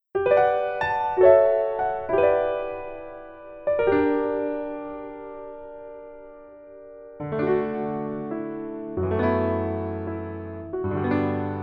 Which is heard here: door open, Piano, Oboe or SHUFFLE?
Piano